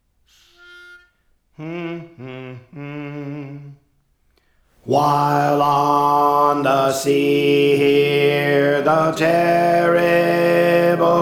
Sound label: Alto; Praise For The Lord Training